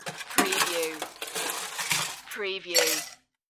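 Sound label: Ice Dig In Glass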